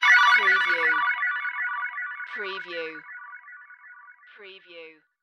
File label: Multimedia Alert